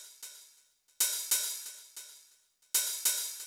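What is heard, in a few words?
open hi-hat, Techno 138 BPM; Best Service ProSamples vol.54; AKAI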